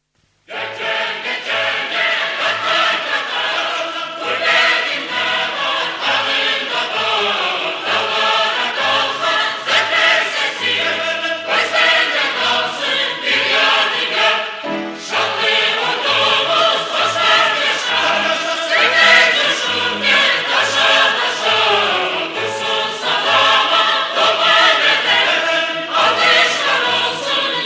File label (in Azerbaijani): marsh